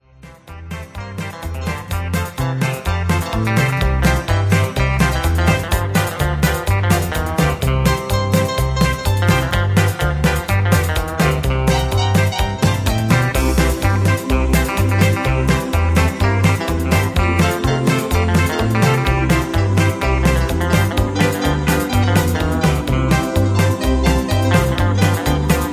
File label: music with strong melody